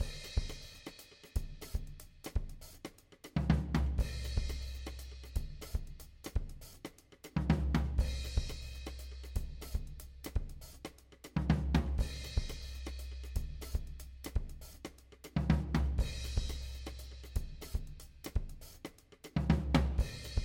Latin Jazz drums groove, afro cuban drums percussion